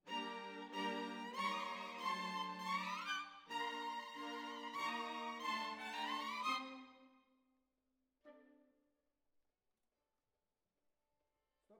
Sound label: Violins Over